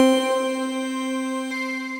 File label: Something Pretty, Synth Keys; Ableton; Glitch and Wash